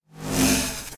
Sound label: Factory Crumble Block Respawn